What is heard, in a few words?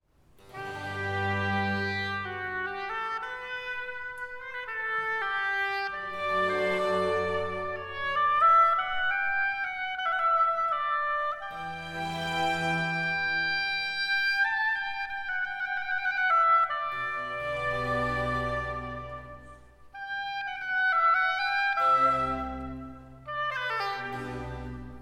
Händel Largo e staccato